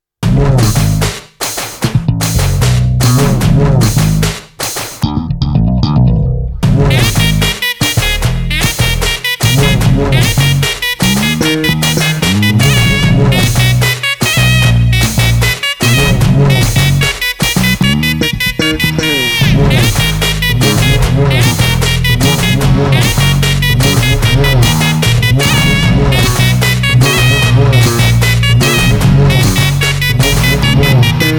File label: Instrumental